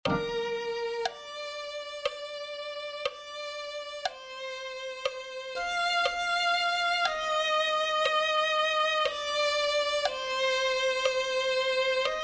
violin

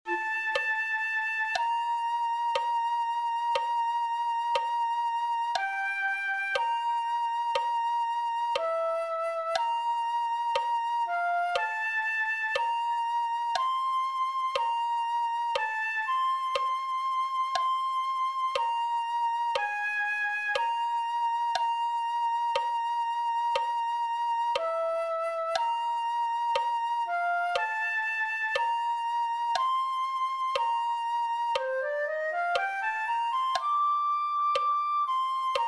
flute

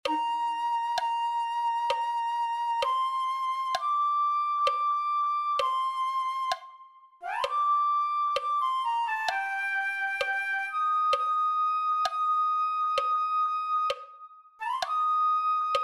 flute

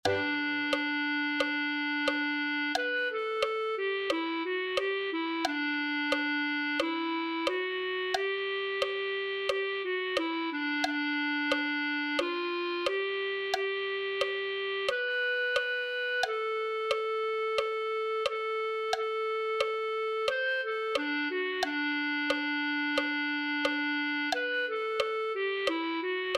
clarinet

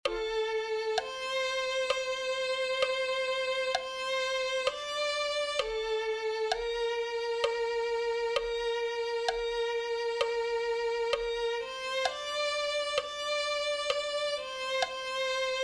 violin

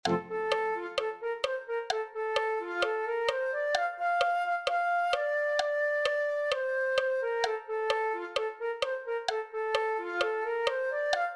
flute

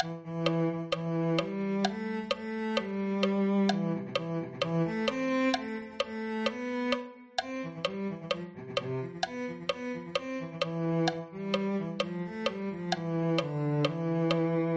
cello

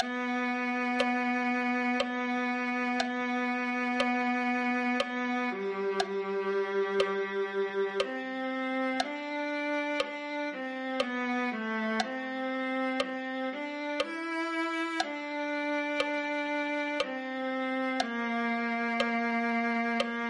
viola

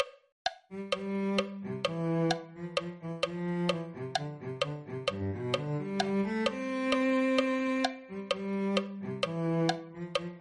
cello

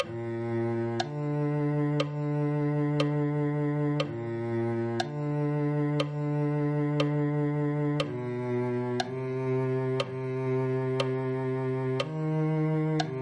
cello

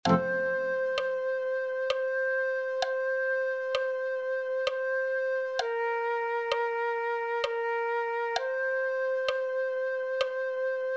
flute

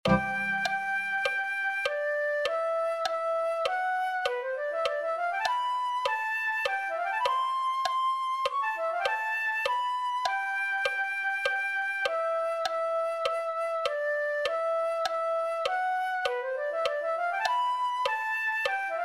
flute

